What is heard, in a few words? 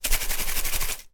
animals fish food flakes in plastic tub shake